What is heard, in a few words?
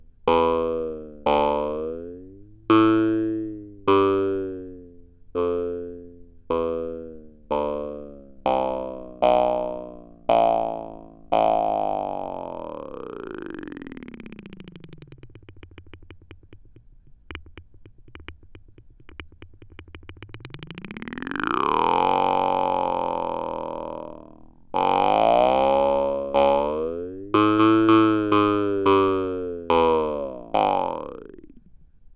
jaw harp